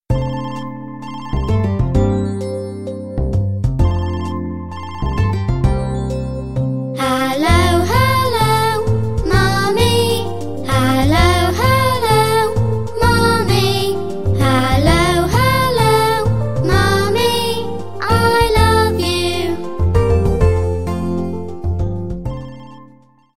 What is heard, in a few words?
ring rings song